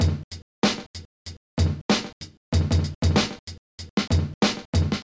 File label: Hip Hop Beat